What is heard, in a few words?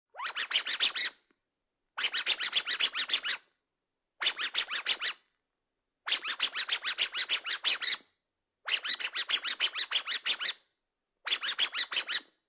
Toy Dog Toy Squeaks Many, Foley, Toy, Dog Toy Squeaks, Several; Objects